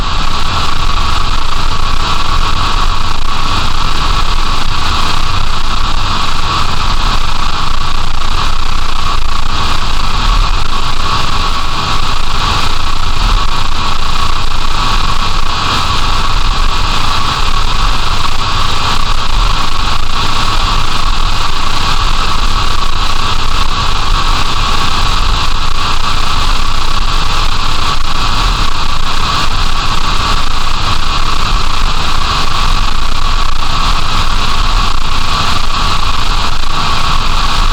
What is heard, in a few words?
static, frequency static sound effects